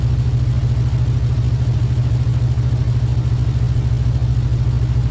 idle, vehicles cars; lwcars

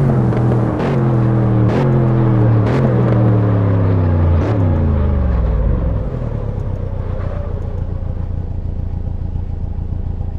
slowdown, vehicles cars; jag xfr; lwcars

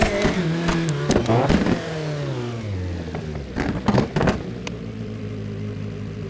slowdown slow, vehicles cars; lwcars; ford rs200

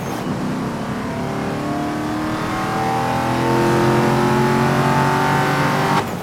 third, vehicles cars; lwcars; volvo s60

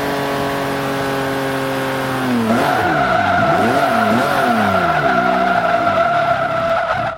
slowdown, vehicles cars; lwcars

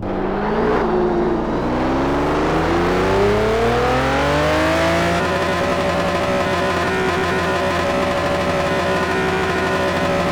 rev, vehicles cars; lwcars; spy c8

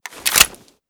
mag in, weapons; cw fiveseven

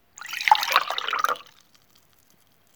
pour; blues pharm

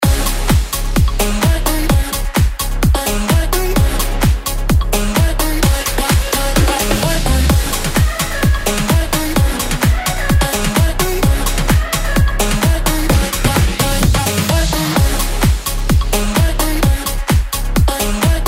victory dance loop; actmod; pubg

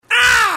scream; assassinm4a1

